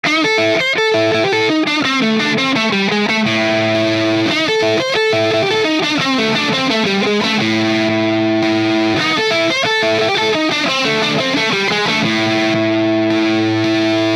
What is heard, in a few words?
Majik Box DA Rocket Fuel Tele Amp Boost OD